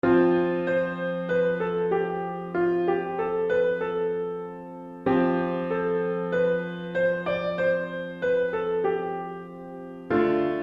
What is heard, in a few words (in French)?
Cornemuse Piano